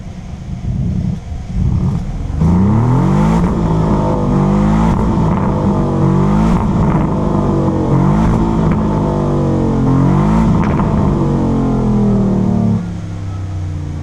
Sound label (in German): Auspuff Urus SE im Stand; ABT; DE Version